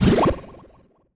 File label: spell gigantism; Crypt of the NecroDancer